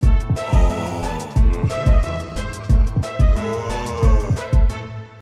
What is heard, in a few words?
game over